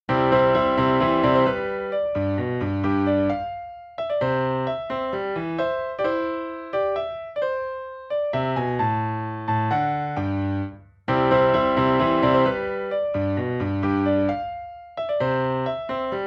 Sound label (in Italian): Abbozzo compiuto di un minuetto per orchestra riduzione per pianoforte; Quaderno Kessler